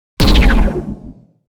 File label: Sonic Pulse Hit